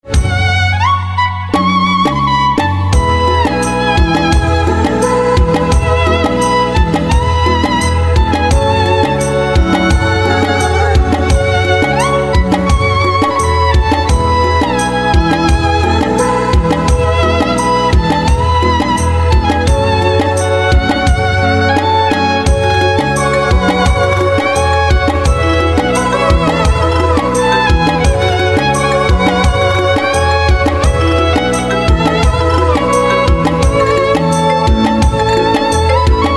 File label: Violin